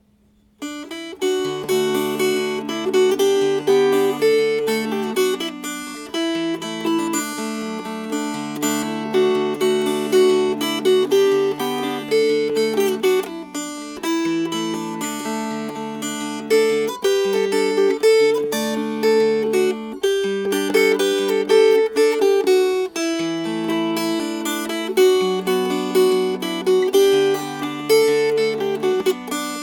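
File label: Fingerpicked, Dulcimer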